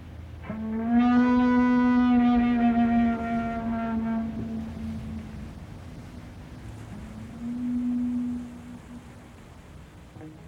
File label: guitar solo